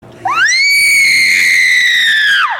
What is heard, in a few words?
my friends high pitched scream